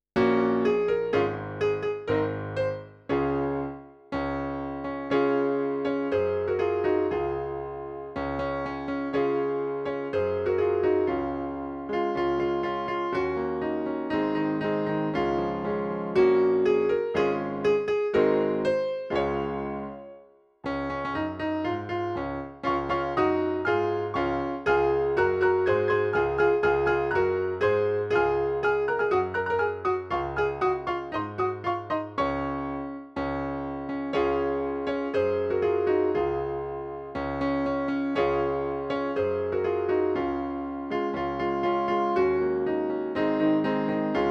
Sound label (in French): instrumental